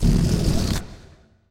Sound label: popup